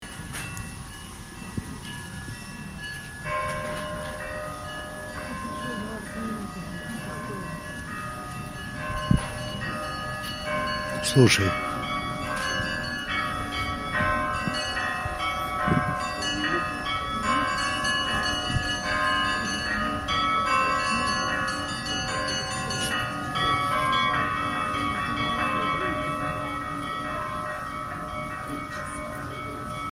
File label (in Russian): zvon